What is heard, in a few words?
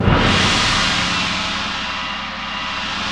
PRC GRONG, Grongkas